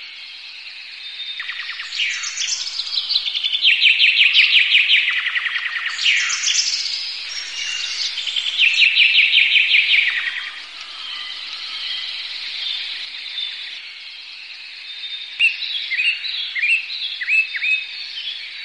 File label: birds chirping ringtone